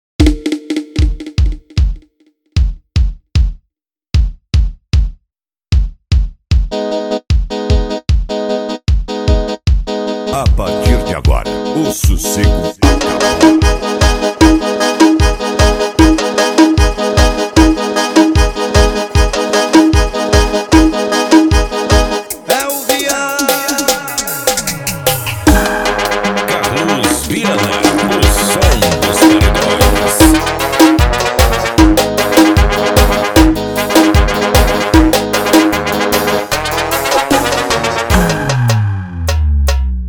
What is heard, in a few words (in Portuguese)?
passagem de som